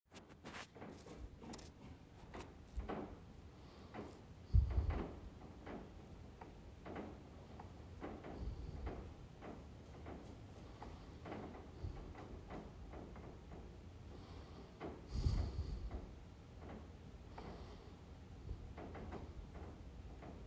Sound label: Noisy metal siding; noise diagnosis